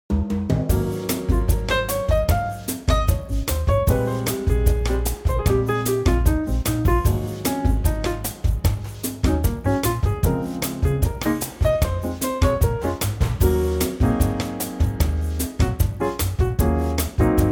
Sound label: Db bossa; key - Db - vocal range - Ab to Eb; Groovy Latin arrangement; TRIO plus percussion